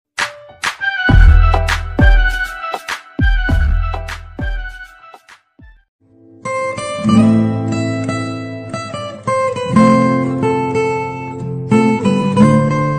guitar ringtone; bollywood superhit song

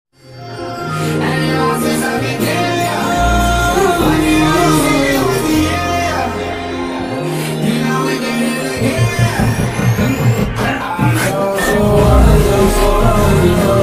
remix bgm ringtone

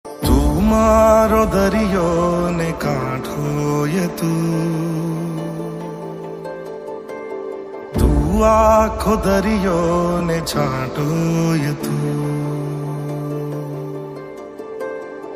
Gujarati movie song ringtone Gujarati caller tune

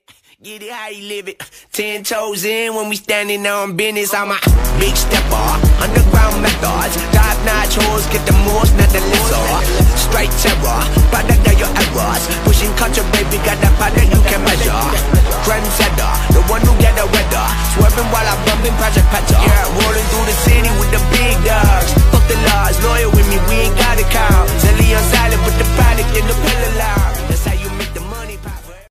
rap song ringtone rap music

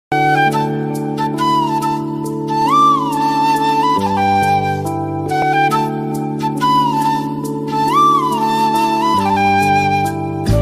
song flute version rain mood

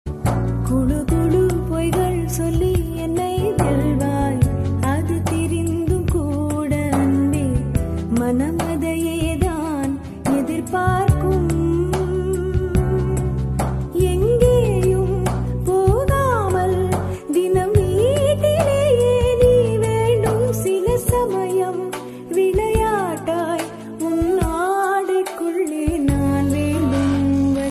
love song tamil

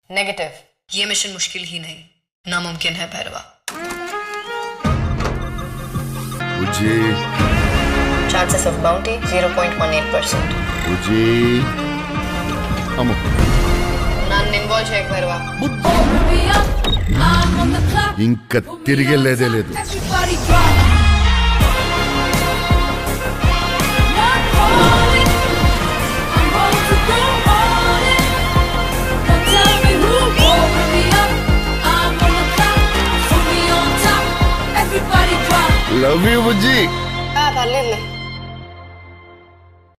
song bgm ringtone; introducing bujji kalki

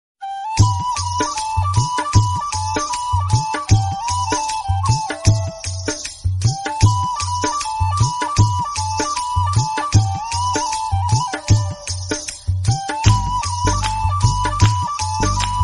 tamil best bgm tamil movie songs ringtones; mudhalvan

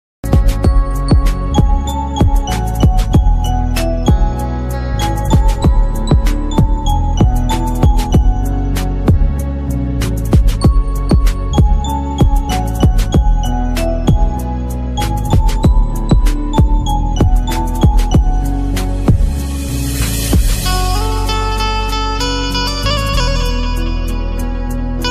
instrumental ringtone; mk ringtone

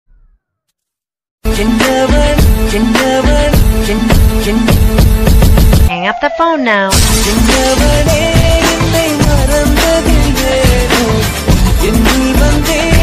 remix ringtone; villain bgm

